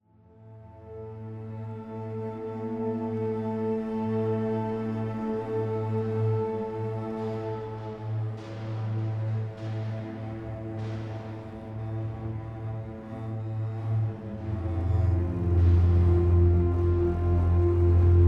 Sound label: Tension Block